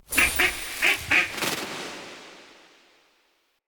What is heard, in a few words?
Duck Mote; Animal Motes